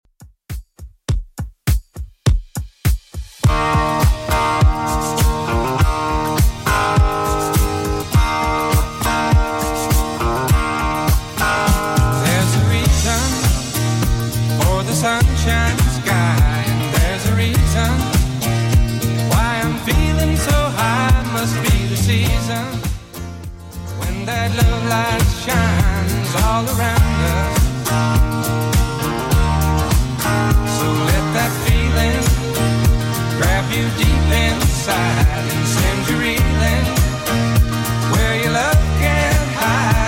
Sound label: 102 bpm